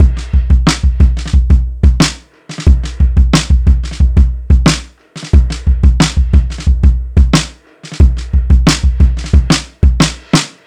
Ride

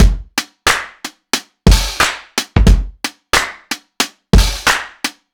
Short Heavy Rimshot

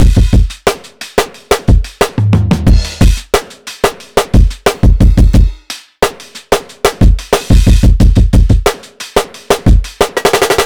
Finger Drumming

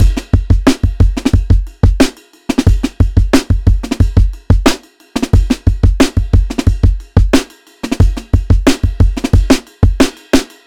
Soft Ride

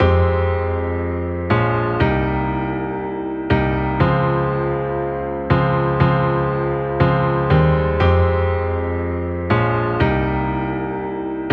Piano Loops

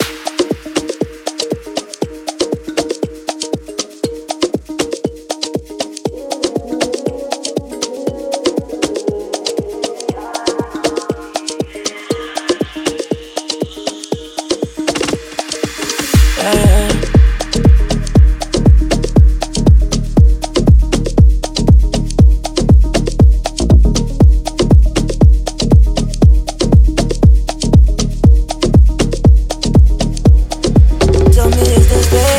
Afro House; Afro House Clave Rhythms; Organic House Textures; Log Drum Fills

